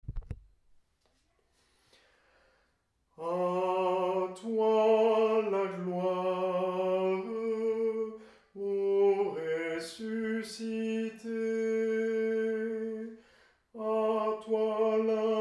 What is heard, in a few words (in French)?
TENOR, chant a plusieurs voix; atelier de chant a plusieurs voix